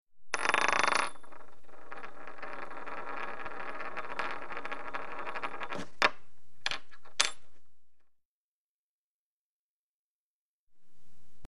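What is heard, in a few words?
Coin Spinning On Floor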